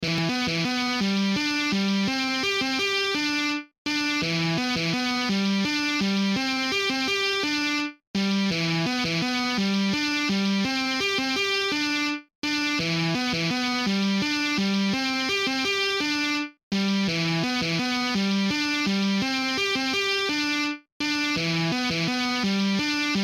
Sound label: Guitar